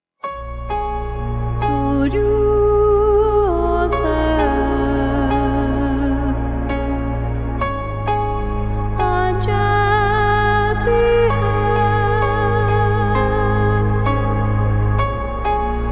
Mirage Rise, cinematic

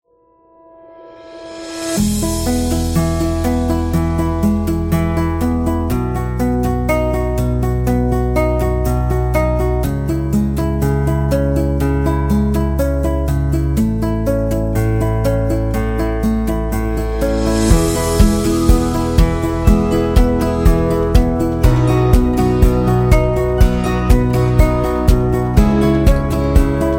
Love Background

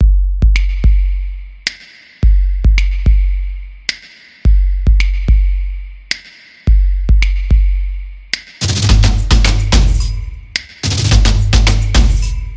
The Drum

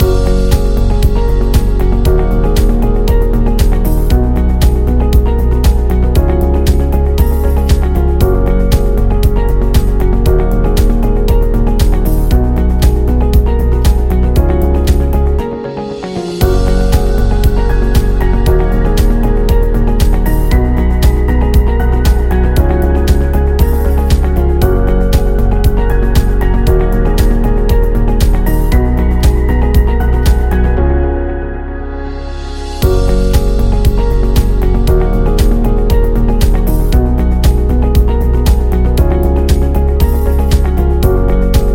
Looped Background Music; service business

